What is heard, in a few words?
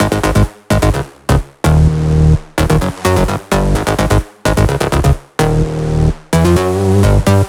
Special Sounds Root G# 128 BPM; Vengeance Samples; VEH3 Special Sounds